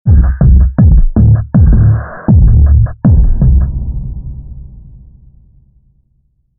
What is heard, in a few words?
Heartbeat